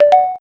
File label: hop; Preliminary sound